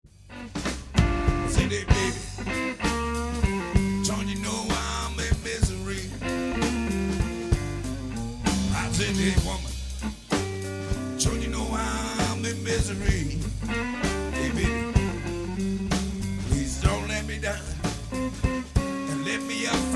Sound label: blues